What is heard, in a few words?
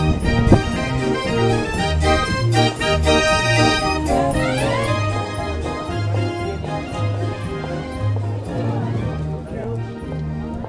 worse than singstar organ